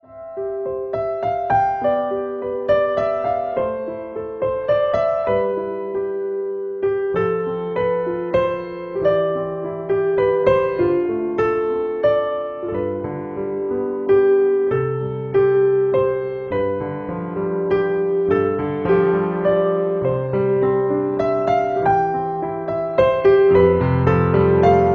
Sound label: happy birthday music ringtone